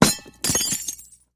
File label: 1 Window Square Being, Crash; Glass Impact; windows & mirrors